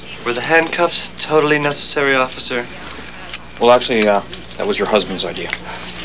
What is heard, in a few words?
handcuffs